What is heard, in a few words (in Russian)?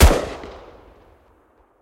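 запуск ракеты по дальней цели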